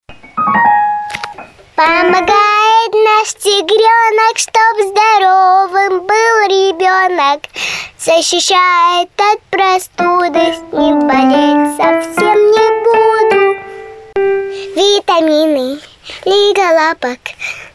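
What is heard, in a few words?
vocal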